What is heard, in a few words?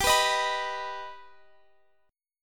B Augmented G, guitar